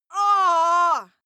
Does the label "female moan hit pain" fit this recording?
Yes